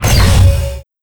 Crane Machinery dmg; FlyForInsanity

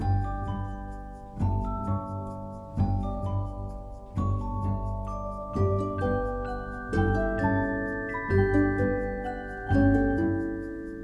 music lose loop; Candy Crush Friends

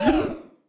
hurt; FlyForInsanity

